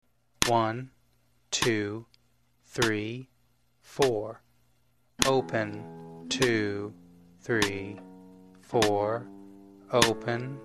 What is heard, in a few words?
fretting 50, guitar, Here it is at 50BPM